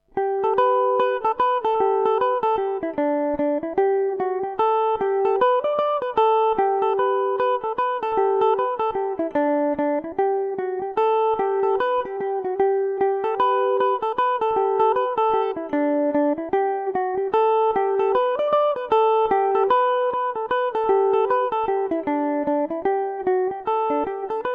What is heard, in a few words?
flanagans banshee gmaj, mandolin